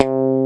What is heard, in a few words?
EG MUTE C2; FUNK